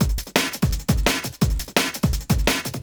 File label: High Life 170, Drum&Bass Breaks; EZ Rollers